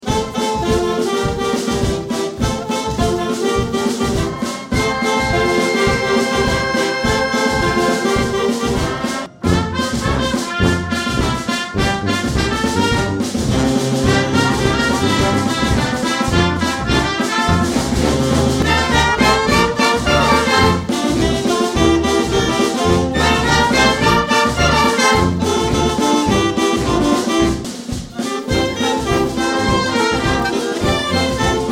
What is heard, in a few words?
banda samba